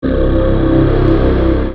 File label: WARNING; Treasure Island